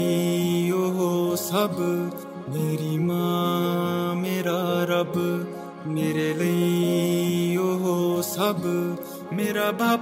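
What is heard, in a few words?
punjabi ringtone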